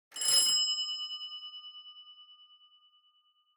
Doorbell Mech Bell, Single; ドアベル 機械式 ベル01-2(シングル)

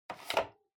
Intercom Handset Replace Gentle

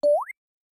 Motion Pop

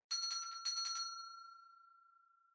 Winning Bell Far Gentle

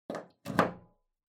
Wall Outlet Wall Plug In

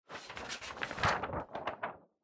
Calendar Flip

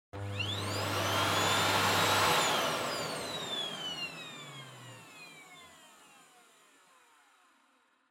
Vacuum Cleaner Vacuum Mid Short

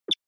Anime Motion

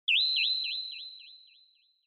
Onoma Flash (High Delay); オノマトペ 光る; 高 ディレイ

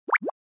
Anime Motion Low

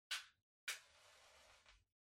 Match Far Strike

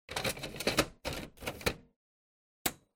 Printer Rear Tray Close

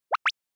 Anime Motion Phrase

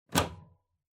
Wall Outlet Wall2 Unplug Hard

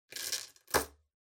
Pocket Watch Put; 懐中時計02-13(置く)

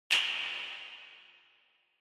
Finger Snap Foley Reverb

